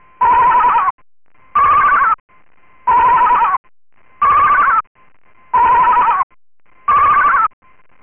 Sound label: Sheep